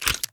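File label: heavy gun cast, attack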